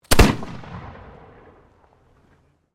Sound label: Musket Flintlock 75 Cal Brown Bess 1780 Firing, Black Powder firearms at a shooting range; Gun Shots